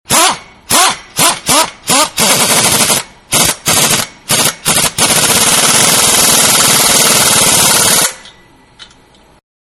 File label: Air Wrench Several; Power Tools (Sound Effects:Tools); Manhattan Production Music